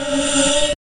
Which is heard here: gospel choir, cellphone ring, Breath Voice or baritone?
Breath Voice